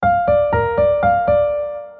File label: earcon